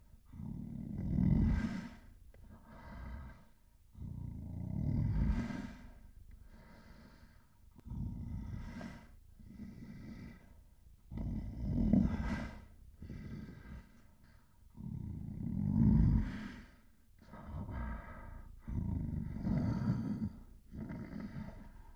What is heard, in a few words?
Bear Breath Low